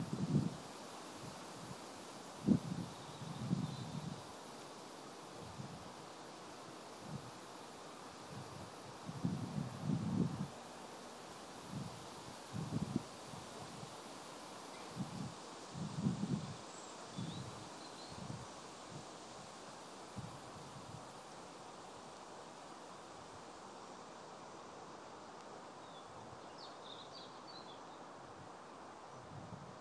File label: windy day in great yarmouth cemetary